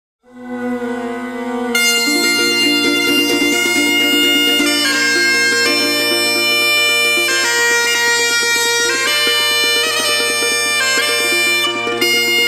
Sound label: duets with bagpipes